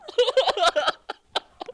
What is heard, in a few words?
High pitched song like male